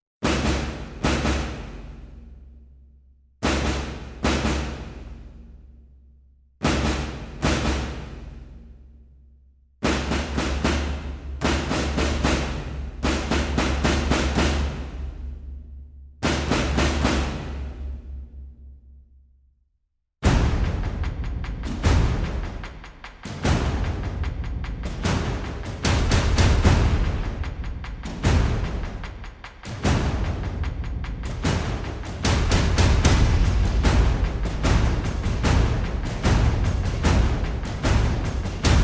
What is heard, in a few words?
Perc Only; Brand X Music